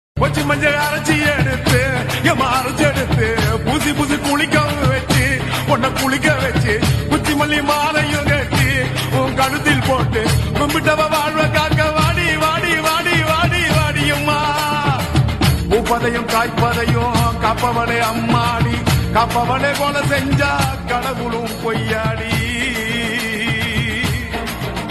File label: amman songs tamil; mariamman